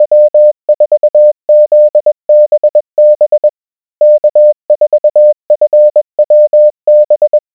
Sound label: morse 20wpm 600hz